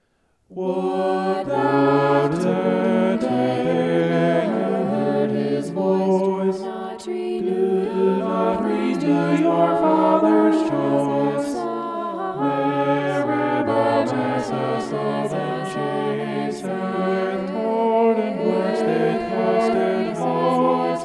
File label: vocal poly; psalm 95